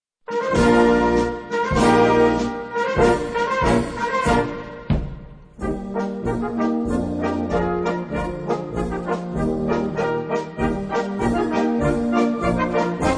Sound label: Polka